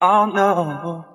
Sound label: "oh no" SUNG